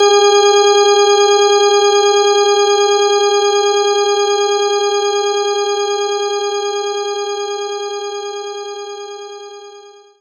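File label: Organ Slow F sharp 2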